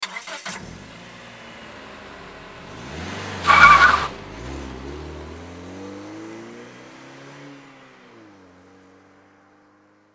Auto Compact Pull Away

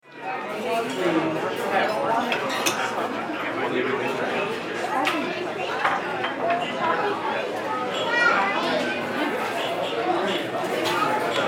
Restaurant

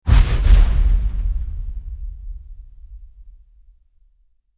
Crash Metal

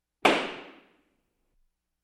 Gavel Hammering In Court

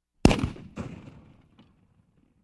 Cannon Blast

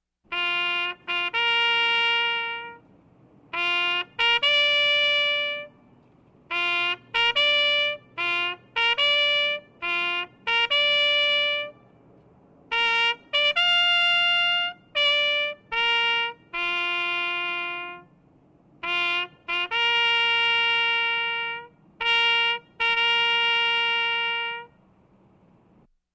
Bugle Call Outdoors Reveille

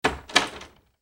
Door Knob

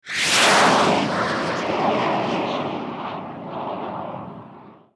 Jet Pack Pass

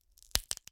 Bone Break

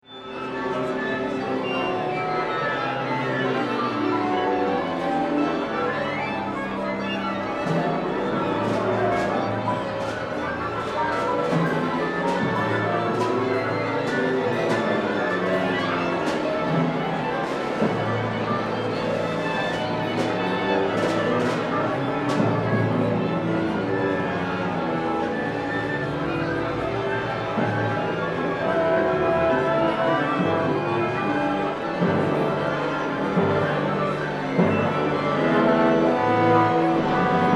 Orchestra Warm Up

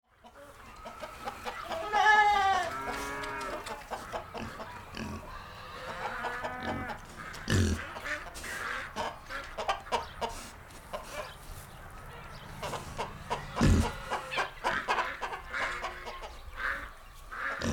Barn Yard

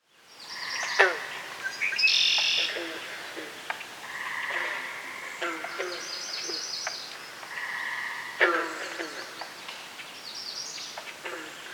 Marsh Day

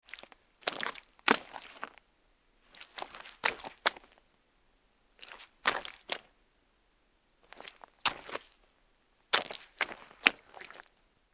Footsteps Mud Scuff

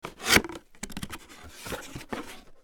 Cardboard Box

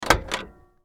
Auto Open Door Exterior

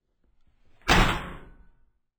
gunshot

